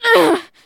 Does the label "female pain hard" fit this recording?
Yes